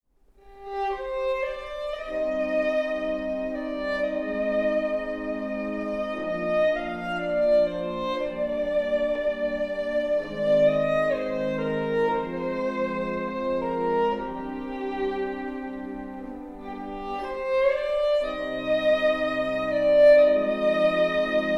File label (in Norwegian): fiolin